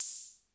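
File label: CR78 cymbal; 90 sSampleCDs; Crypton - DrumBase5000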